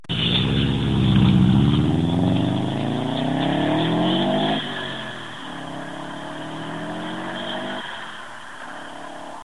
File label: scooby exhaust; oldscooby